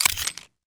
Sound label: mac10 clip out; garrysmod